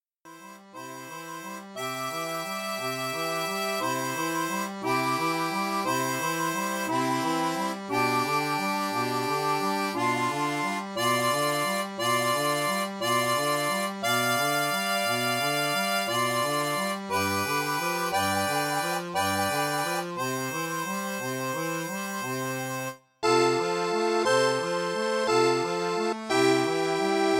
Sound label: Czerny arpeggio; Carl Czerny; Scuola dei Bassi; in Arpeggio; Valzer; Un-Due-Tre